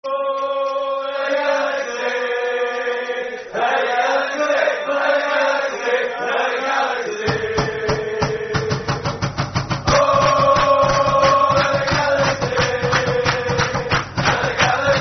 Ohh L.a. Galaxy [Football Chant (Soccer Song)], Los Angeles; fanchants; Los Angeles Galaxy Lafc